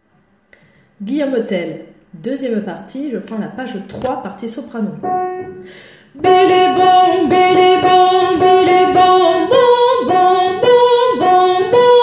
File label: Soprano; G. Tell